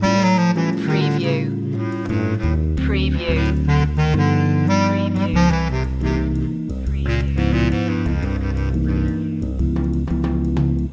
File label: loop